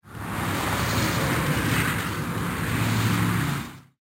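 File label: Traffic High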